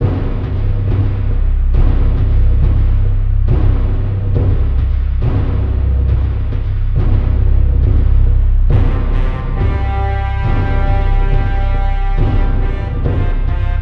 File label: Tension Brass; Real Warfare 1242